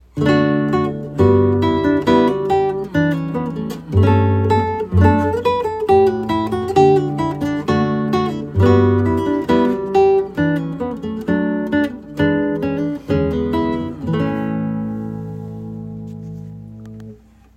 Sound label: recessional bouree; Bach